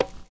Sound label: PERCURT; Univers Sons - Kitch 70s